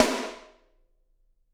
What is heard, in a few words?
SD, Drums; Bob Clearmountain Drums I